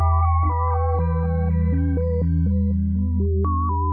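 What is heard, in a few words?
122 BPM Sequence F major D minor Tonic E; innovative sequences 1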